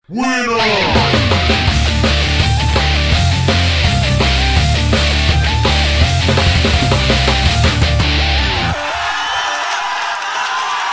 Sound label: Vinheta Winner; htr slot car simulation windows gamerip 2014